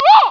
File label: upper; mk2